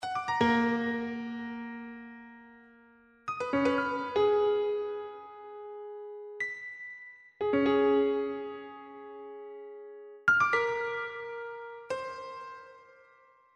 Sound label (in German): klavier; eisblumen; Algorithmische Kompositionen